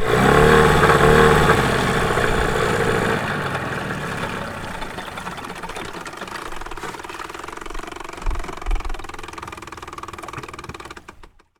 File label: boat motorboot stop; best service Blue Box; sea traffic